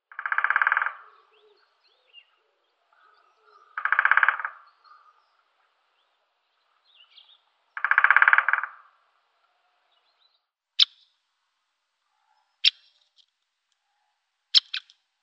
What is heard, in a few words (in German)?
Buntspecht Picoides major, Vogelstimmen